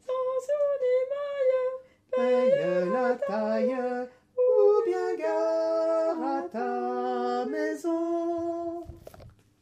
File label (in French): basses